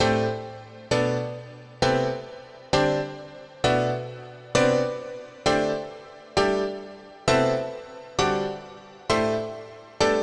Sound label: Dance Piano; super crush k.o.